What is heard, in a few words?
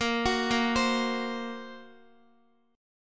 A sharp Suspended 2nd Sharp 5th A sharp down Guitar; arpeggiated; 4F,4A,5C,5G,5C,6E; JGuitar